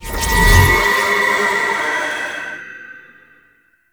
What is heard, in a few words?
Mirror Portal Start; Warframe; Duviri Murmur